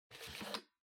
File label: Music Box Foley Card Insert